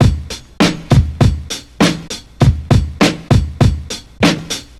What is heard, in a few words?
Big Beat